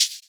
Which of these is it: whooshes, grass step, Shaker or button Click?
Shaker